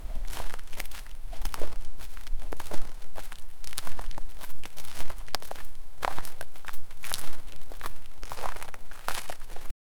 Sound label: continous footsteps on gr